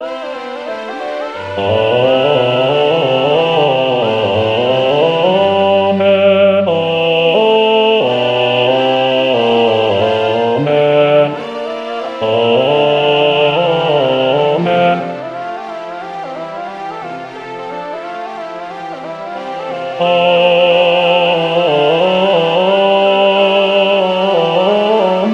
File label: basse sicut